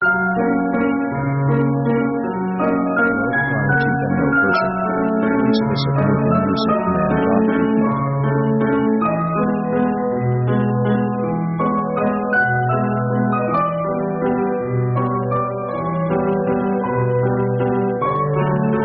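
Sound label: piano french waltz; orchestra themes; piano french walz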